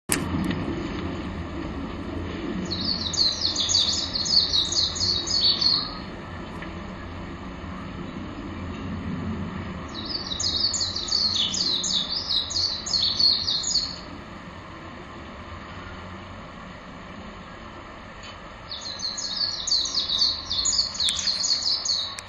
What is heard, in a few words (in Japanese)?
メジロ; 高倉